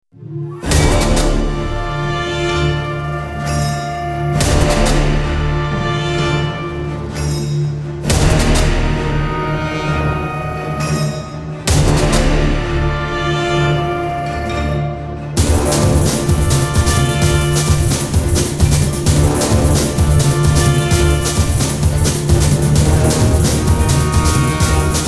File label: Samba